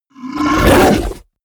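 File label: fishman bark, creatures; kreaturen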